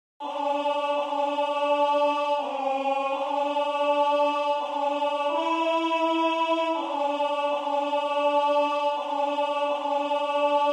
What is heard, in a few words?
alto; while shepherds watched their flocks